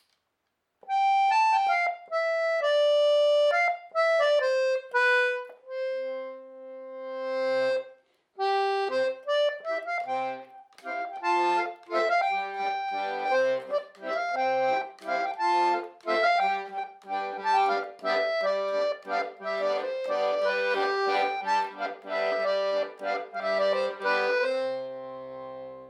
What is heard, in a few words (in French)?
accordeon bourree